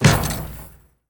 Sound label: Street Ball Dunk Chain Wood; SFX Kit